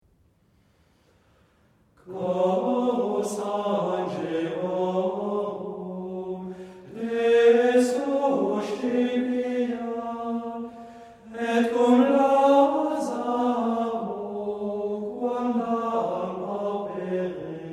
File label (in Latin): Antiphona Chorus angelorum